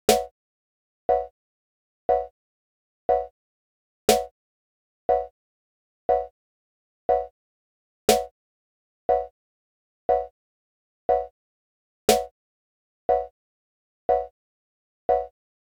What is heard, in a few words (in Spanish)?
Redonda 60bpm